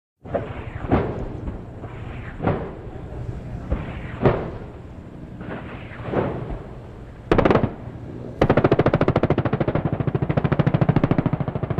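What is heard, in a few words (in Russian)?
звук битвы Cobra ракета запускает атаку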